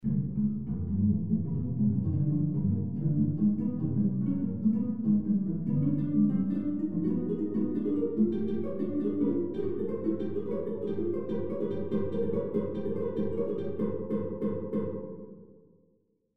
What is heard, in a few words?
cello; Mass